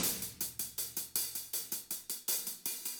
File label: Boss Transition; Okunoka